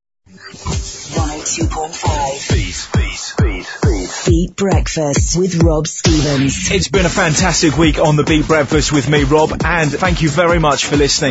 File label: interval signal; Radio Station; jor-beatfm